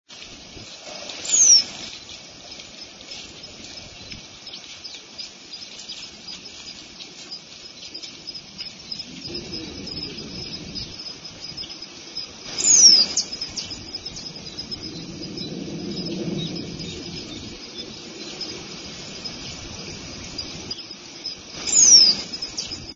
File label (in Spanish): capuchino